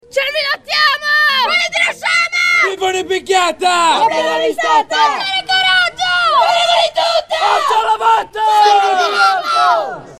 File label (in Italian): scout reparto gridi squadriglia cervi grifoni pantere alci; scout nuoro 2 reparto